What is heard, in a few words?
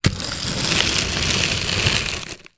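ice large piece crunch slide movement on ice; ice large peice chunch slide movement on ice; zapsplat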